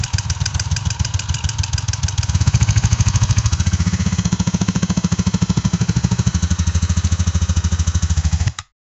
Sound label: sound of motorcycle